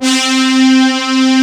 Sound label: D70 BIG HORN; East West; Global Sounds